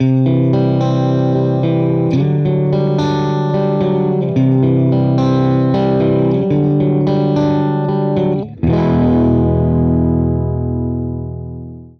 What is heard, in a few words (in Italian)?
acoustic no, Esempio chitarra pulita; Acoustic Simulator